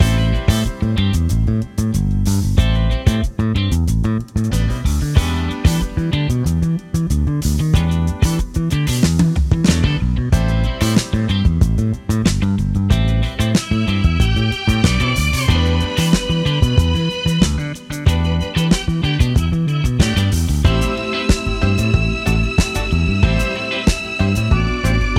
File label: Disco bass; EZbass MIDI; Classic Disco